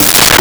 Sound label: Conga Hi; Nintendo; July 2020 Gigaleak